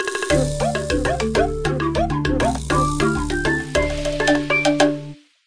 task completed; Karamja Diary - hard

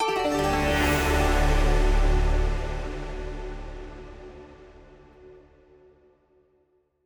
Stingers sting game start

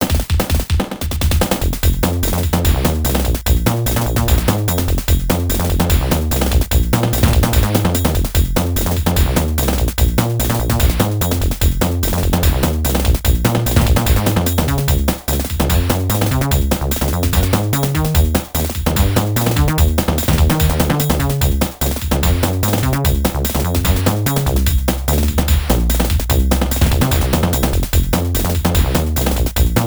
In Game Bgm; Chou Kyuukai Miracle Nine; genesis

